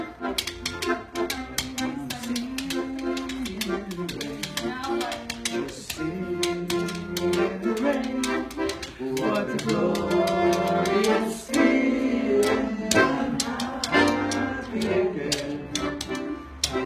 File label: We danced project